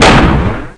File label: explo; dactylsp